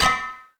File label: twai; Truth-Hz - 1-Hit KO Sample Pack